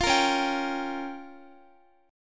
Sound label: Db 6th Flat 5th, Guitar; Db6b5; strummed; tuning=4E,4A,5D,5G,5C,6F; x,x,15,15,13,14